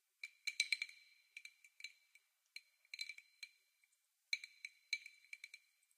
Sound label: ice in glass, backgrounds; Secret Agent